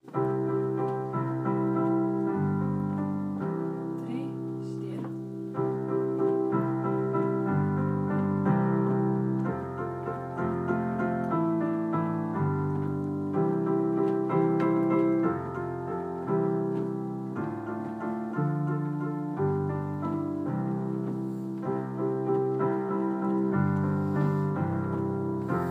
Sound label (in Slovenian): KLAVIR; Sovica Oka